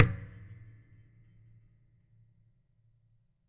Hit Flick

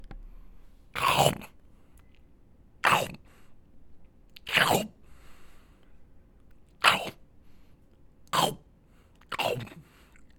Chomping

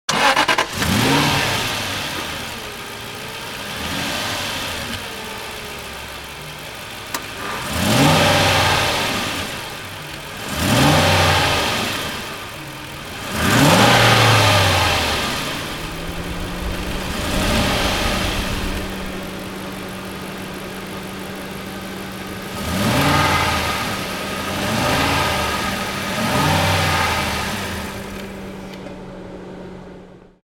Aston Engine

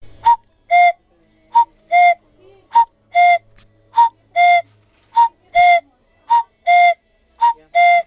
Coocoo

